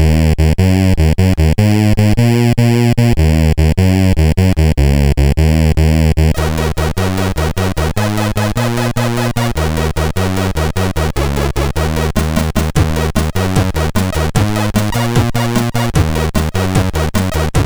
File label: Crystal Sheep, sid MOS6581R2